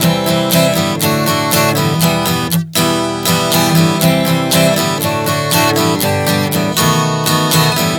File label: C-D-Em G-B 120 bpm, acoustic guitar chord progressions